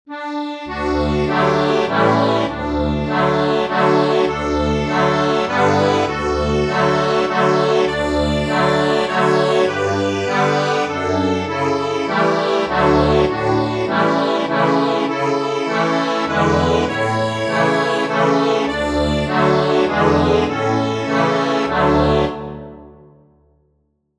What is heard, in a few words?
When I survey the wondrous cross, accordion